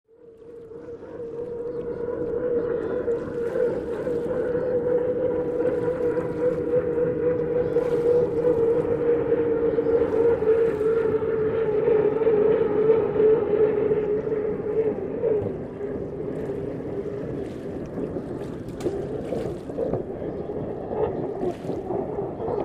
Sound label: Hydroplane By Ambie